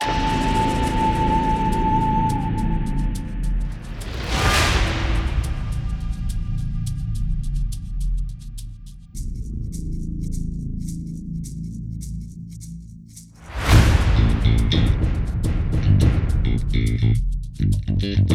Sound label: Low Combo; Aztec Jungle